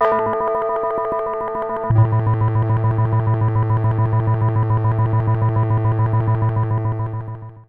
square spinner, A, bright; Trance Formation

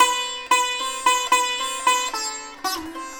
SITAR, Indian Traditions

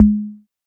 Checkmate Perc, Keio Checkmate

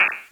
Drums, Hits; DCM8

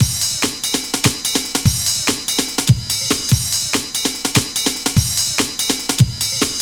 Ride Clap, Drums & Percussion, Electronic; Sonic Foundry (Sony Creative Software) - Electronic Point Blank

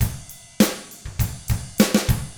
ROCK, Drums; ILIO; Double Platinum Drums 1

